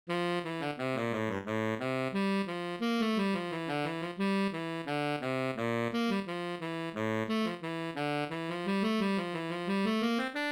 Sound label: Bari Sax